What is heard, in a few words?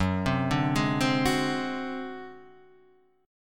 Ab 7th Flat 5th Gb, chord, guitar